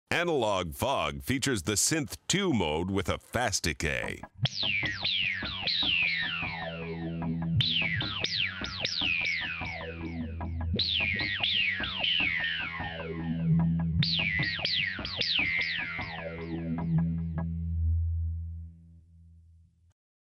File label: Analog Fog